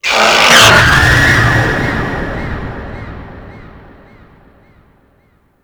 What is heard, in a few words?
Vay Hek Frame Charge Roar, Vocals; Warframe